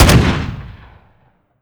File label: weap fire; gmod; weapons